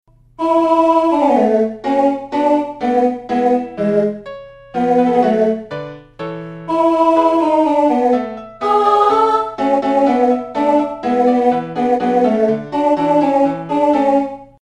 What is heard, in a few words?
mozart alto G; lyon